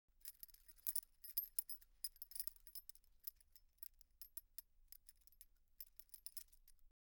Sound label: Earring Jingling; Fairlight Sound Lib